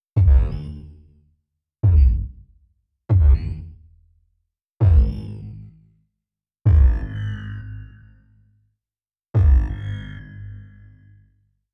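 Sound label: Impact Low Strings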